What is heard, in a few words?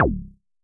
K Juicy, Vermona Mono15 Drums Kicks